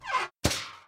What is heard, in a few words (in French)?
porte